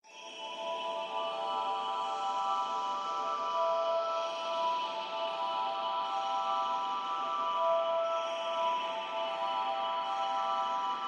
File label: Underscore Huge Reverb